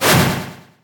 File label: axe